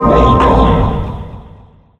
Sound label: door start; Shadows of the Damned